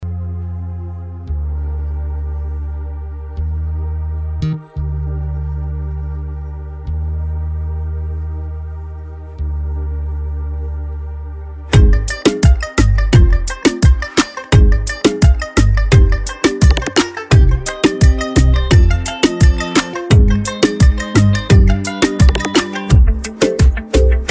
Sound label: Karaoke Song